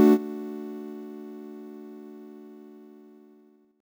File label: chord hit, 113BPM; last of the chord hit thickifiers